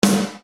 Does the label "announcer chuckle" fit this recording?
No